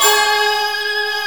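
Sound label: G#4 soprano, ORCHESPHERE; Northstar; The Wizard VOL-1; ORCHESPHERE 2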